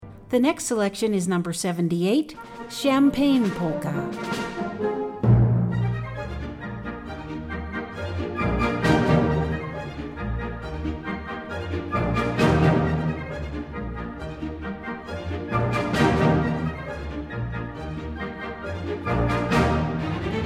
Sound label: Polka; Champagne Polka